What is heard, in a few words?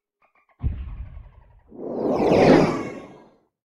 sea torpedo; Blind Drive